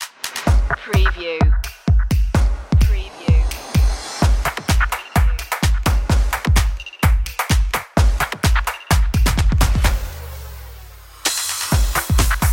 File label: action energy drums